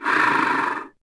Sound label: Bear Heal Vox; Civ4